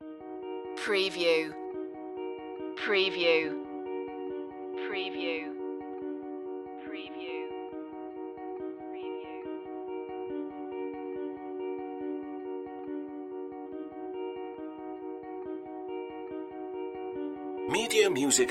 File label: dark wood guitar